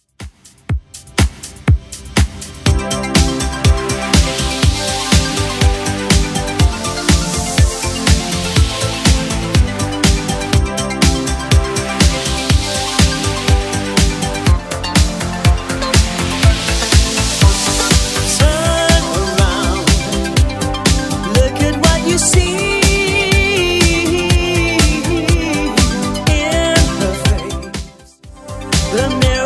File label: 80s 122bpm; Redrum